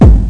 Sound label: tr-909 linear; bdrum samples